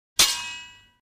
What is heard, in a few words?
Sword blow; cold weapons